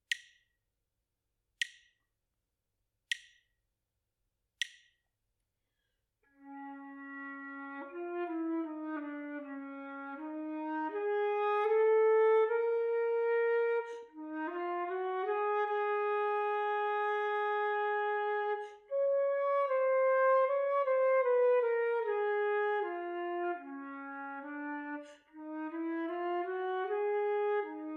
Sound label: melodic error detection